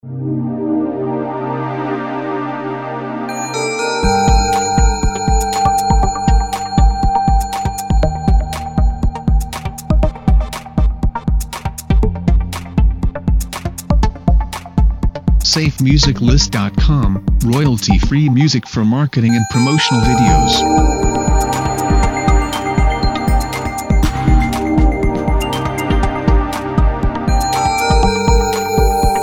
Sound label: Ambient Electronica; SafeMusicList